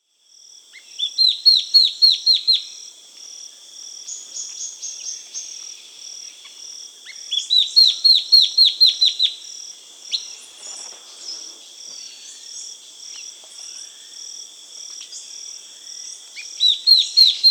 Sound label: Dot-winged Antwren (Microrhopias quixensis), Peru, Birds of the Amazon; S. America